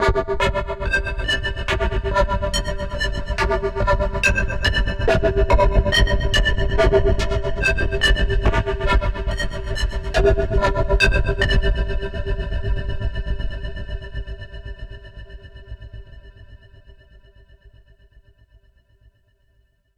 Osiris, Pads; KJ Sawka; Drum n Bass & Beyond

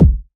BD, Waldorf Blofeld

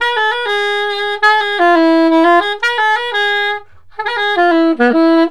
SAX, Balkanish Traditions

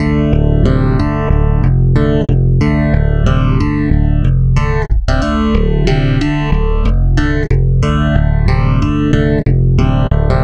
Stick Loop, Stick Bass

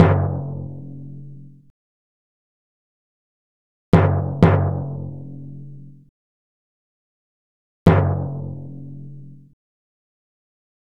Timpani; Sonic Foundry (Sony Creative Software); Club Joints and Street Anthems; Razor Edge

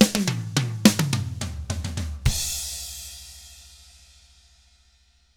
pop rock drums; 105POP ROCK

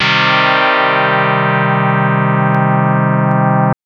Synth FM 2 Op Basic 2 min c3

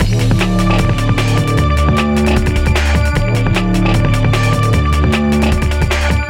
Hardcore Breakbeat, 152 Dmin; Big Fish Audio; Hardcore Breakbeat Action; Gone Insane

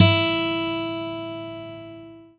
GUI AC. E, Ac. Guitar; Sound & Vision; Gigapack I CD 2; GUI ACOUSTIC 1

